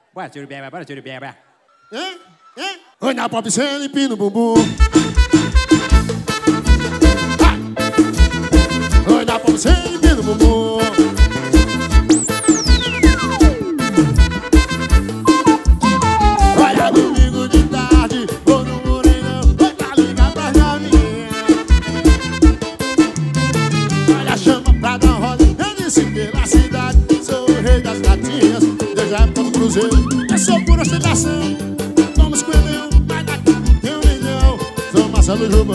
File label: pop